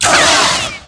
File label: saber hit laser; lightsaber